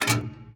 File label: gun reload; World of Tanks